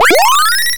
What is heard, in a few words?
Powerup